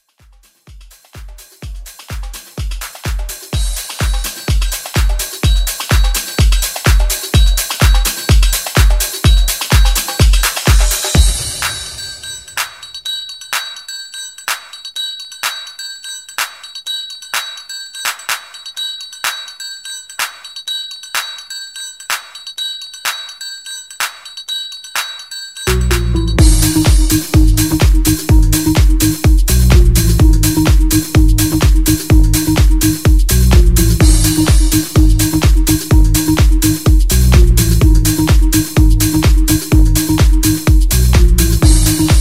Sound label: Disco Mix